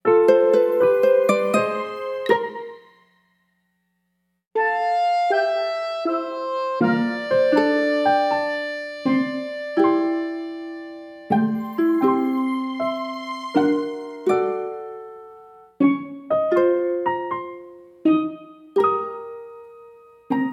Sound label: Main Street 3 – Night